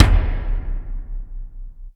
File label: hard drums; 90 sSampleCDs; Crypton - DrumBase5000